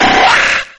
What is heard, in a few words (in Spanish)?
Grito; Scyther